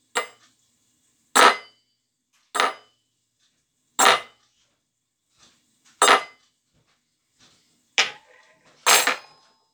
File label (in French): Metal chute de couverts